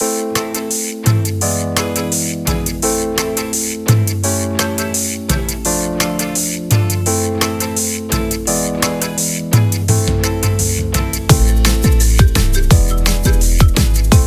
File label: cumbia fado; weeklybeats 2026